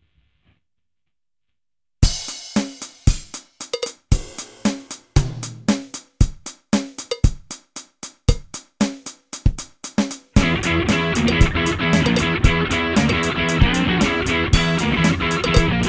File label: generative chainsaw; weeklybeats 2012